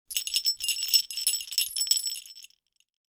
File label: jingle bell